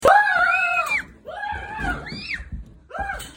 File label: scream